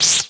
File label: ls fail fire, weapons